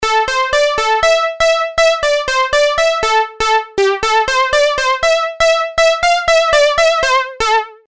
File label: Elastic Keys